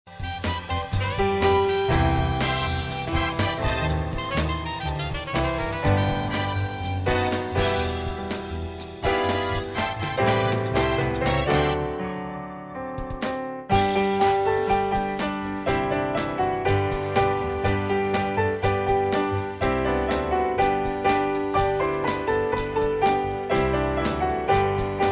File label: christmas special on piano